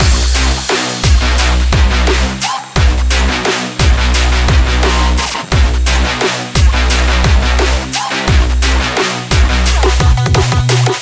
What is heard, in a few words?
energetic dance trap loop